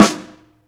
Corn Snare, Snare One-Shots; Drum n Bass; KJ Sawka; Drum n Bass & Beyond; Drum Tool Box

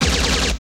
machine gun; Total Control - Action Cutz

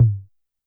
Tom Analog Soft, YAMAHA RM1X

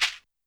Shekere, YAMAHA RM1X

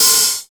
snare+hat; DRUMS

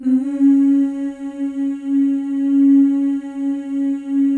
FEM, Symphony of Voices; Spectrasonics

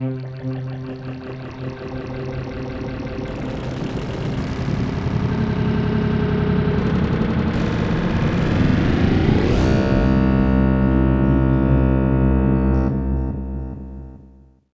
atmo, atmosph, special fx twisted

